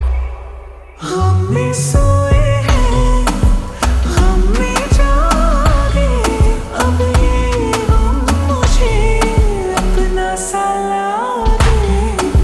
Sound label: Ringtone Slowed Reverb